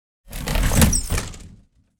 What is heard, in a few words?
urban debris plastic little object passby